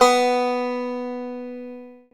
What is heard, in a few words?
Banjo B3; CMI